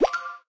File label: pop From Storage